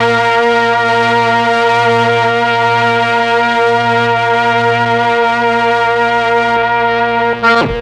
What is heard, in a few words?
MEL STR, Legendary M400; ILIO; Propeller Island